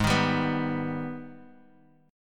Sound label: G sharp Minor Major 7th G sharp down Guitar DADGAD